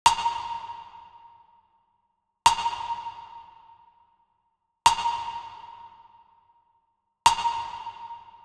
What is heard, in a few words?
Drum Loop 100 BPM Snare; Cymatics; Vibes II Drum Loop Collection; Eclipse Drum Loop